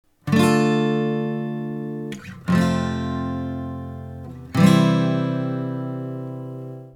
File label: tonic super8; fretjam